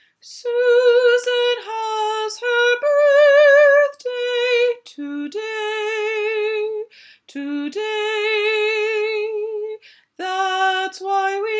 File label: Birthday Song